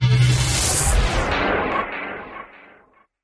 freespins symbol shot; Dragons Myth

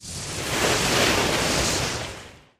attack; Skillzgaming; battle royale desktop

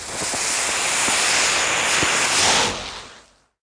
Spark Fountains; Temple of Luxor; genesis

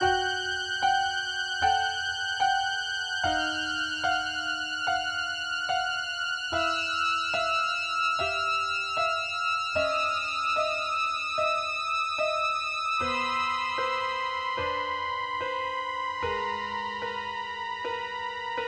Wheel Music Loop; Halloween

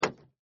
click fault; Skillzgaming; fruit Blast 92 Desktop

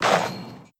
Ball Goal; genesis; euro Golden Cup Desktop

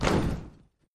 battle button; Skillzgaming; battle Mania Desktop

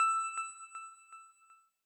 win indication attention; riviera Star; Fantasma

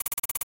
Wheel Loop; Halloween

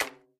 crossbow load bolt; Skillzgaming; battle Mania Desktop

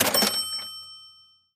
bonus note appears; common bonus

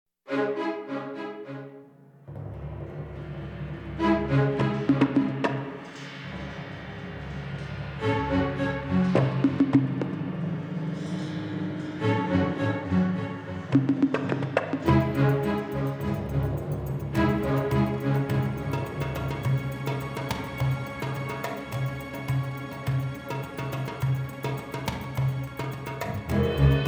bonus level; Recode; jewel Quest Riches

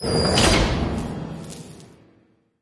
cage closing; Dragons Myth

